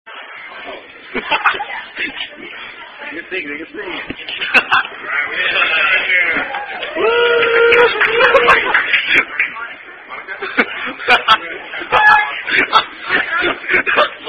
Happy Birthday (Standard) Sung By Chili's Wait Staff